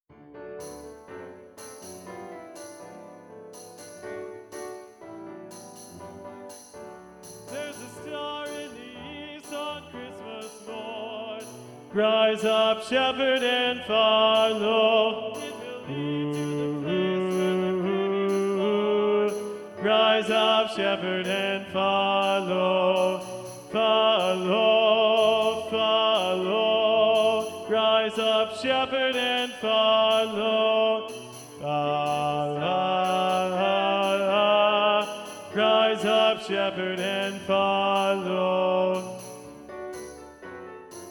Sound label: bass predominant